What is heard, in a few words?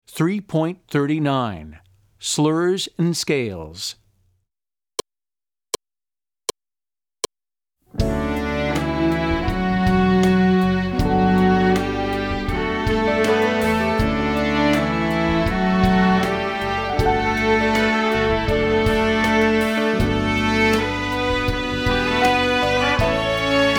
Slurs N Scales